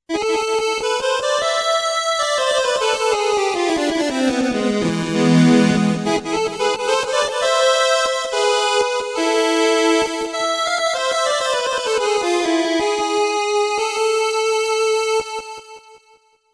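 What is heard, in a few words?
accordion; style patch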